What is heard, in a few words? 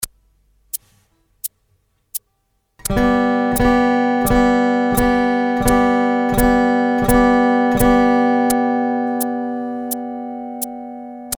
Strumming G Chords